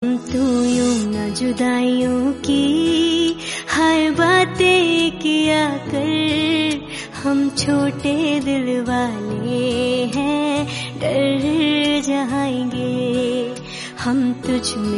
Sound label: FEMALE VERSION